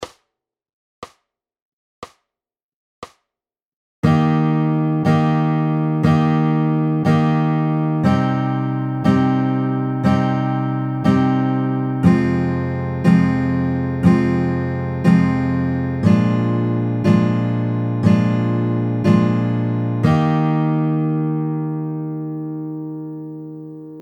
Giro di Mib maggiore Ebmaj

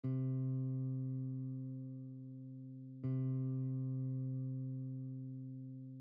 Intervallo Unisono

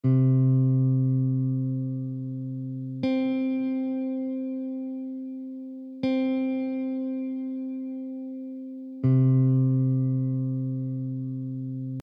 Intervallo di 8a giusta ascendente e discendente

